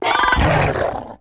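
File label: creature choku attack light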